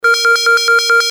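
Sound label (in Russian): zvuki trevogi, alarm sounds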